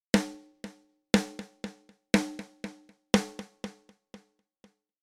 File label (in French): exemple delay